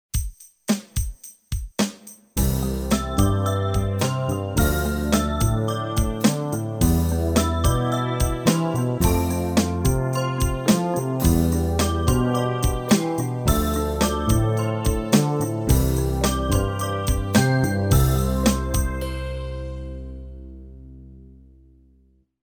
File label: Pedal Exercise Slow